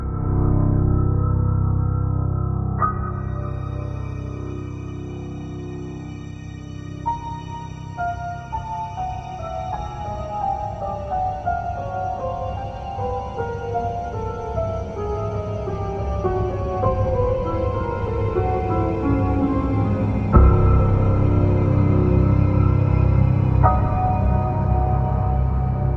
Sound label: Creepy Piano Vivo Z5i Ringtone